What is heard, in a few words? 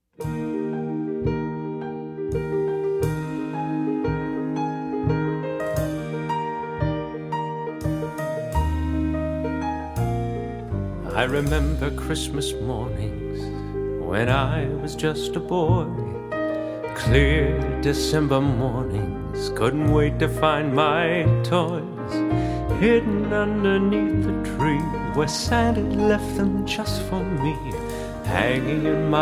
Christmas Mornings NO SAX